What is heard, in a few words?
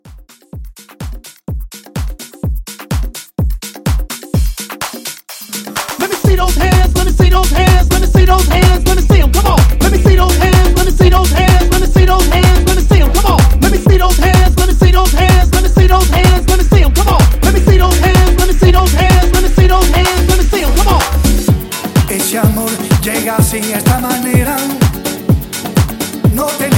Latin House Mixshow